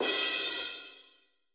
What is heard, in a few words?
cymbal, Roland MC-909